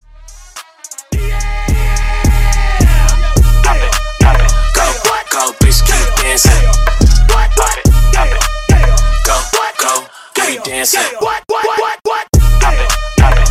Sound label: Acap Ins. Extended Breakz 107 BPM